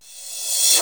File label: Reverse Crash Cymbal, Yamaha DD-7